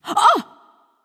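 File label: surprised, female; smite; voicepack; hera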